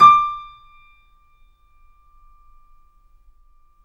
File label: PIA GRND, Roland; Sound & Vision; Gigapack I CD 2; PIA GRAND 1